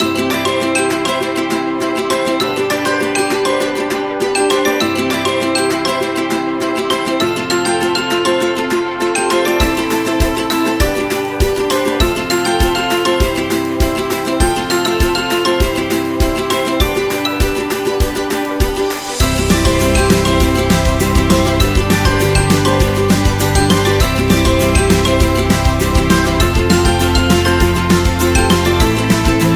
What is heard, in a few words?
Kid Upbeat; office background